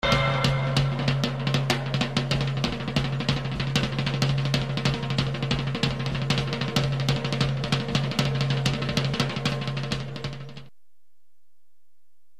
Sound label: drums